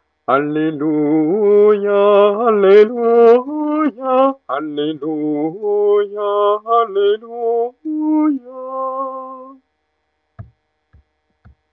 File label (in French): Alleluia tenor; mariage